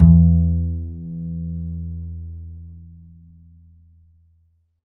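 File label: double bass E2; Univers Sons; Jazzistic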